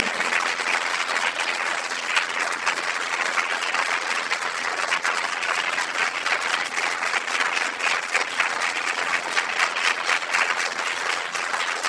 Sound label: applause